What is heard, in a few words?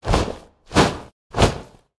Wing Flap Medium Demon